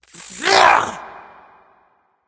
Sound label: grunt attack; smite; voicepack; kali